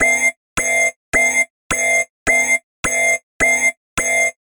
science fiction incoming weapon alarm for science fiction console